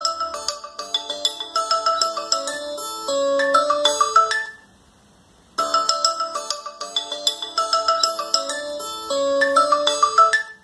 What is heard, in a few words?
Asus ringtone